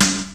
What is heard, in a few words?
noisy acoustic snare g key